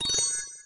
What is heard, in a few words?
win estrella; bouncy golf